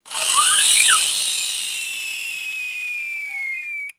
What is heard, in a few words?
mechanical wind up toy whistle spinning down; toys